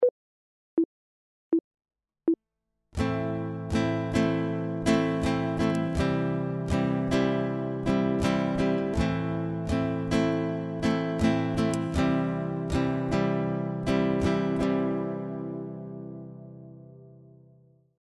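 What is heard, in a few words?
chord, guitar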